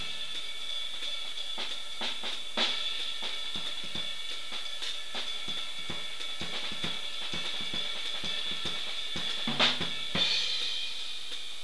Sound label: swingin